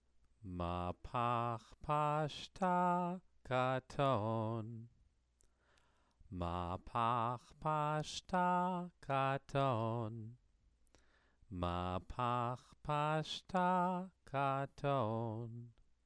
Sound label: Megilat Esther exercise